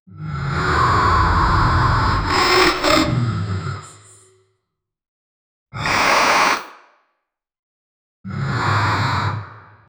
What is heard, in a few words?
mechanical cybernetic slu